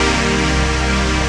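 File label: synth A#, STAB, House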